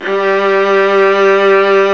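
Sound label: G3 VIB. violin; Northstar - New Gold VOL-2; ORCH.COMP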